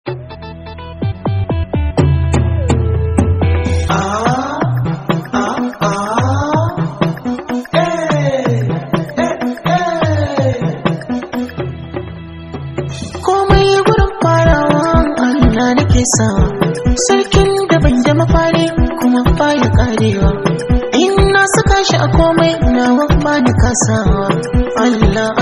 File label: Hausa Song